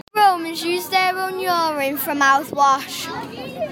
mouthwash

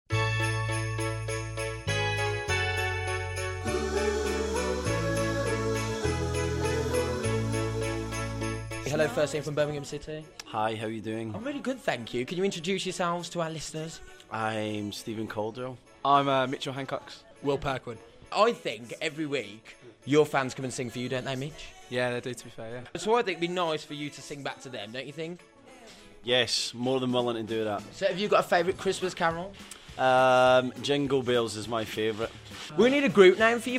blues carol singing

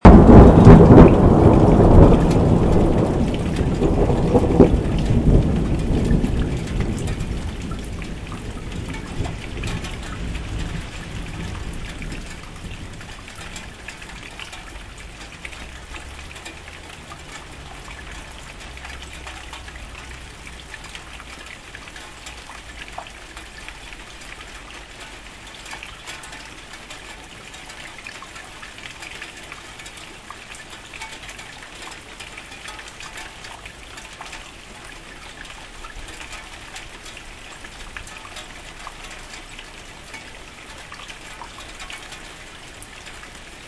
rain thunder metal chairs

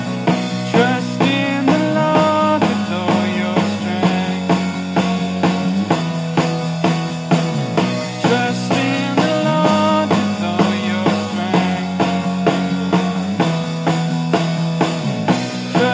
bass drums

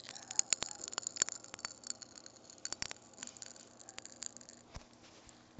pop rocks

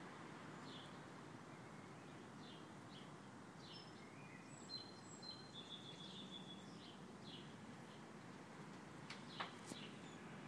pittsburgh morning ambient sounds

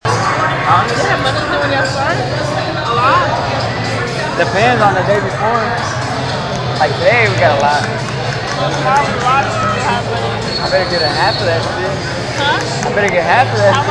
ambience sounds sportsbar; rockets game

